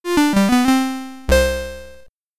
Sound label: Missed (SID Stereo); Wonderboy Commodore 64